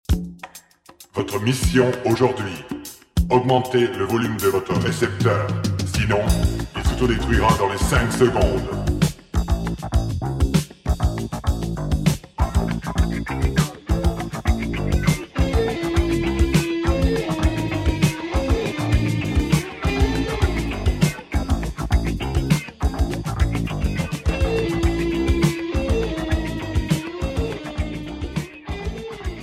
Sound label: Jingle